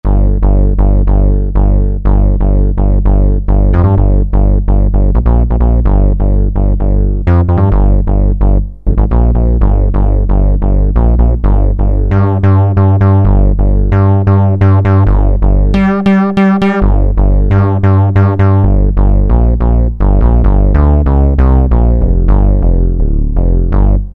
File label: Syn Bass, Roland D-110; Factory Patches